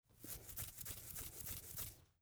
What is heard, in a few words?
scratching head